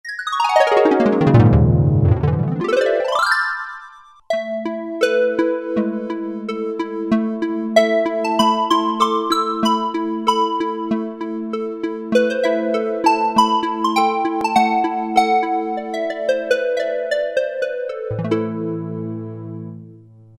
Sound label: Ensoniq ESQ-1 HARP